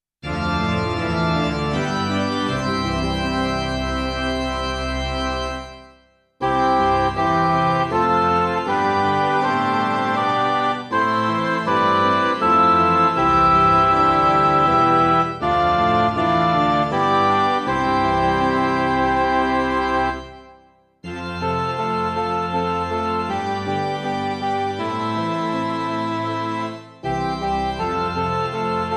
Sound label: midi